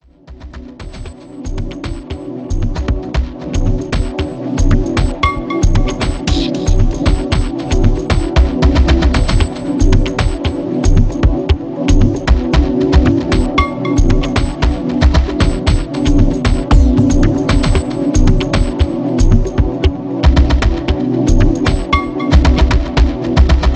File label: pad